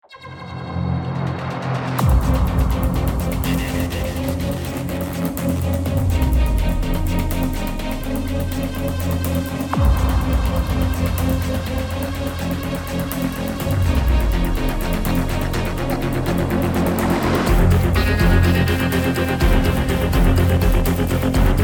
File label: bomb planted; music